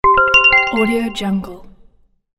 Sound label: bonus